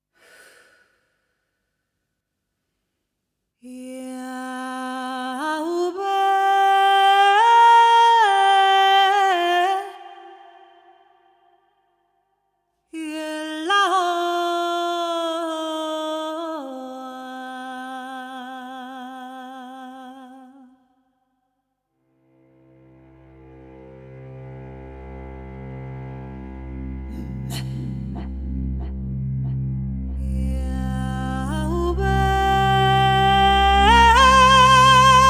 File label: breath in